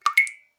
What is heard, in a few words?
Drips